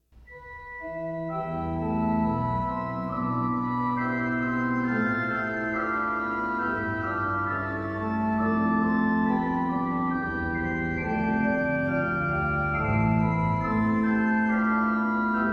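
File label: Bach Orgelbuchlein, organ; BWV 621 Da Jesus an dem Kreuze stund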